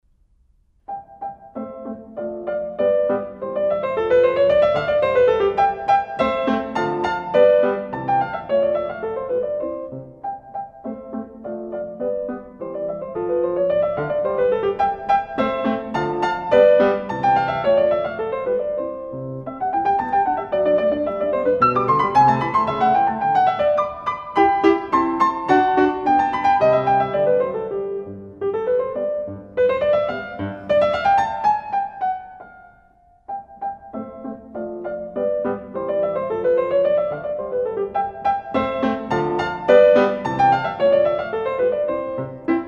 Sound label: Piano Sonata No. 15 in C Major K. 545 Facile III. Rondo Allegretto